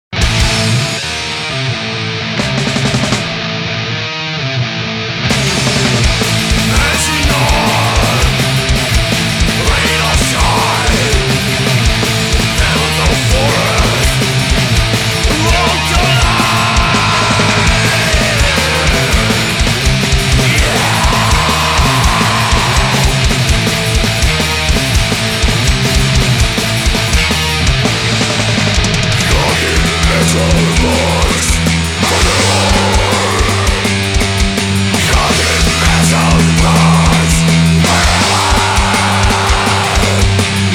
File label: metal punks